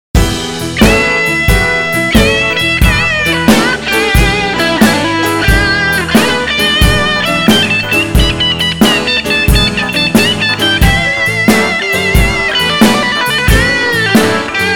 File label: blues